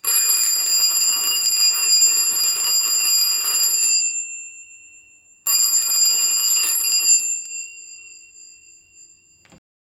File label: household